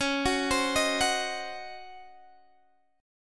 Db Major 7th Suspended 2nd Suspended 4th Db down Guitar Open G